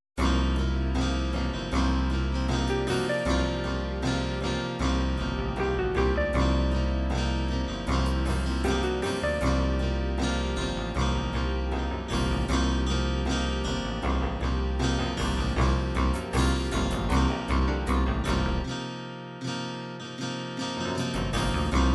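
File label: ballad 8 beat slow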